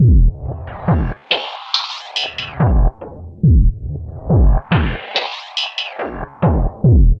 FILTERS L, Dark Side Of TripHop; USB Soundscan vol.35; AKAI

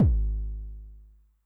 BD MOD Decay Extra Long, bass drum, 909; classic machines; 909mod

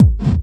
hardbassdrumz, breakcore; Bazzdrumz

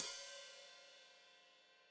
Hat Foot Open, Hi-Hat; Deeply Sampled Drums

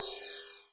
Cym 60s Flange, Alesis D4; Drum Machines

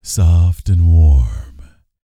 Vocal Planet R&B Dance BASS CATCH

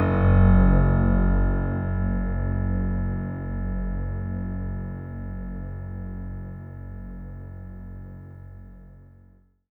F#1, synth

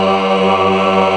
MELLO CHOIR

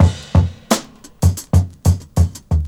OLD SCHOOL, Breakbeats

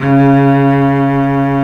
CELLO C#3 L, SOLO STRINGS; Greytsounds VOL-1

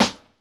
perc, Roland MC-909

